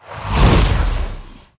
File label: arcane nova; veznan